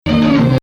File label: Husseini Low; Actually Rast; "Husseini" refers to emphasis on 5--here lower octave